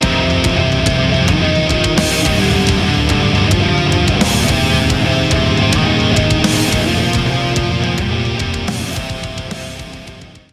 Stinger; Wasteland

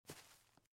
SFX step grass; Out of Reach: Treasure Royale

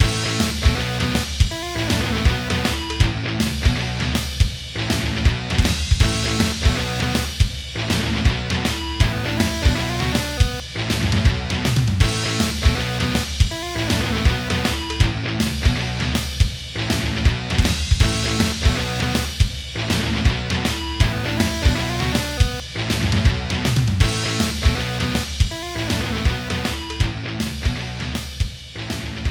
3d Music

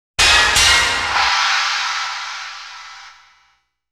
Challenger Appears; Nintendo All-Star Daibunto Smash Brothers original soundtrack

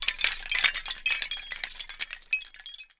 Foley Jingle Long; gamerip; Hellpoint

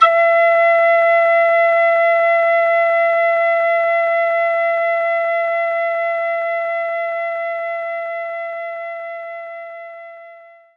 Whistle E4; knights try

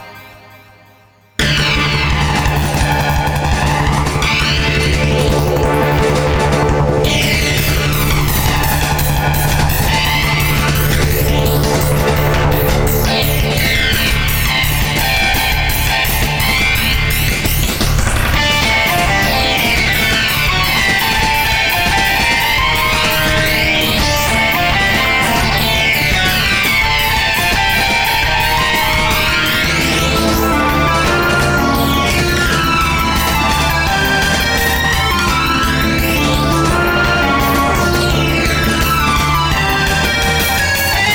bgm subway run; Shin Megami Tensei Imagine gamerip

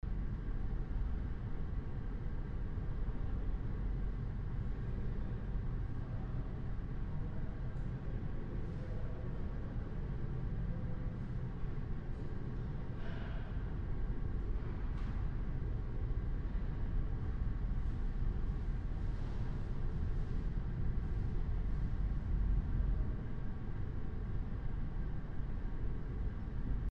Hallway Ambience

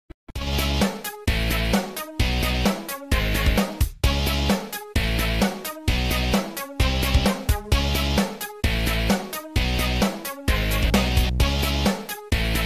main-menu; ben 10 alien force vilgax attacks; nintendo ds gamerip

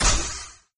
ghost die sound; Arc of Templar

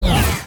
Gear

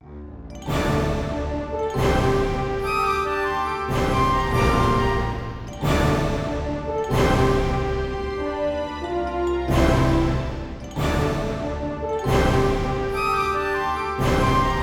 Bonus; ShadowCaster reworked midi soundtrack 2019

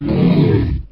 Defeat

